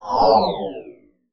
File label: Shuttle Fly By, Sci Fi